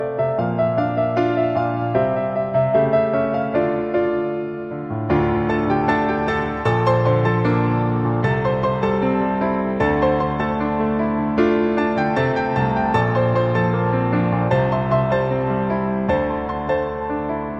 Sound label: piano cover